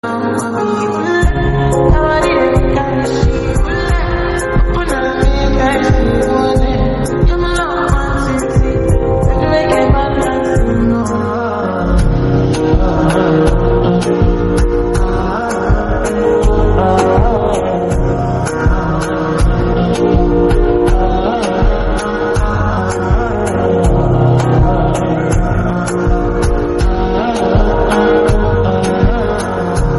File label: slowed instrumental